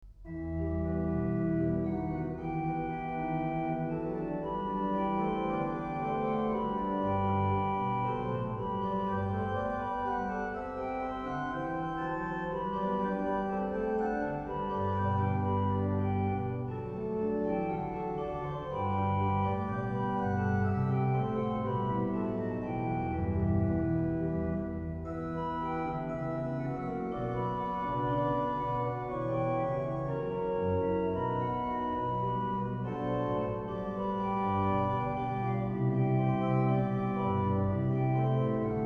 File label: partita iv, Great Organ Works; Bach; Great Organ Works 3